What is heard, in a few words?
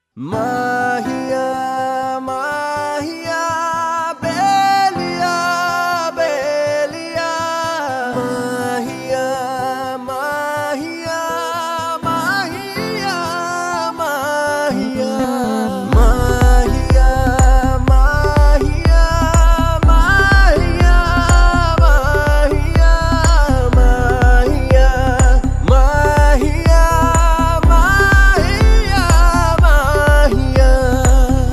Humming Tone